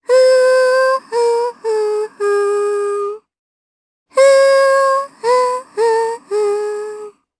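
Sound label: Vox Hum, Japanese; Kirze; kingsraid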